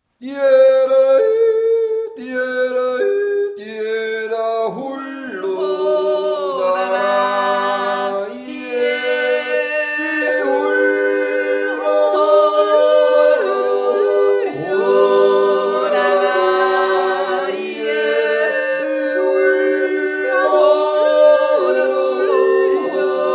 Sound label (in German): Jodler